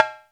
sfx perc

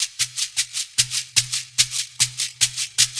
Shaker, Percussion Loops; Ambient Grooves

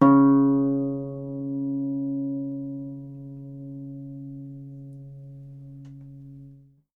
Harp C#3; Miroslav Vitous - Percussion & Harp

